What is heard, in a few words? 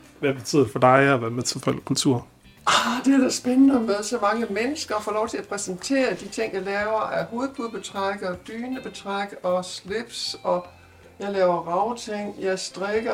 music